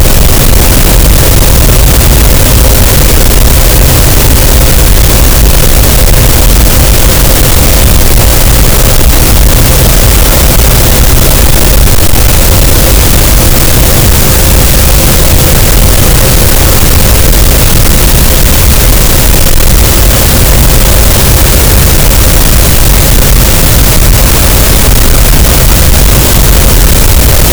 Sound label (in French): cachalot negatif, gaussian noise